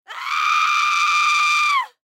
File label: Human Scream; Scream Element Young Woman; Blastwave